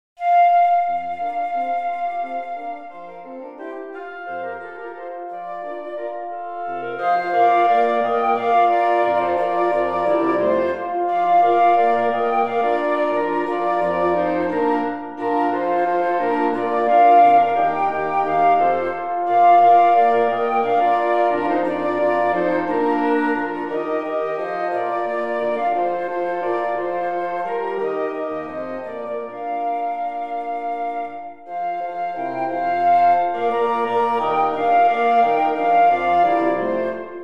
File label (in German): blaeserquintett